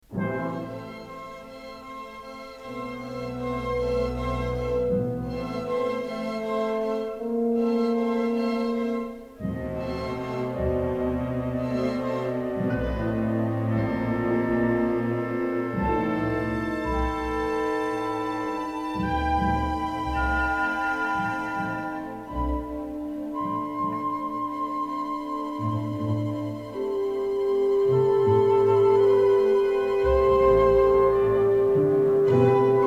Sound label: fantasy for cello and orch